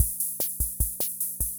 Kent K-220 Rhythm System Loop, drum machine

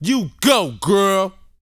VOCAL F; ILIO

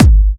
Trance Kicks, Bassdrums

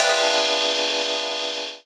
RAW RIDE; Rawcutz; Nu Forms

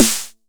909 SD; Goldbaby Tape808 & Tape909; The Tape 909

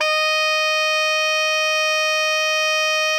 ALTO FF D#4, saxophones; Greytsounds; Philip Wolfe David Crozier's Saxophones

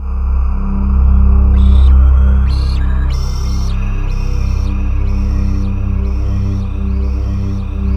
chaos, ethereal atmosphere; USB Soundscan vol.13; AKAI; C2

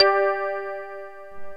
PERC 5ths

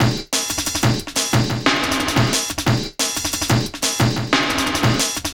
Killer Beat, Drum & Bass, Beats & Breaks; Fluid Dynamics

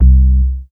C3, BASS; Q Up Arts; Dream Experience; BASS BANK 7

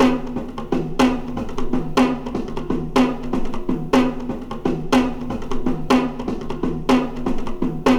INDO DRUMS; Zero G - Ethnic

